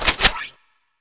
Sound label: beamgun reload, weapons